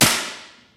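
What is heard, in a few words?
physics clipboard impact large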